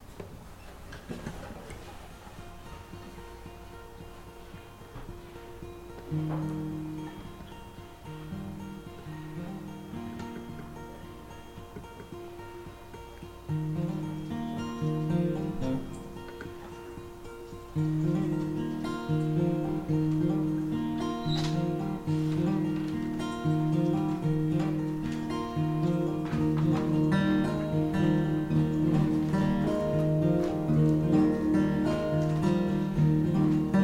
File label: loose guitar